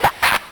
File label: Vocal Pitched Laugh